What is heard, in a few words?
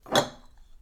Catching Beer Bottles